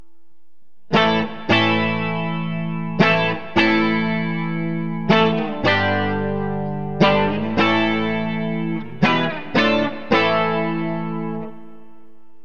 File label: bridge clean; burny